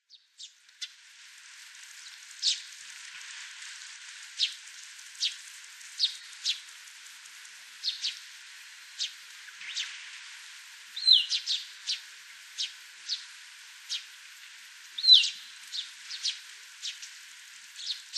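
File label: Russet Sparrow; nikko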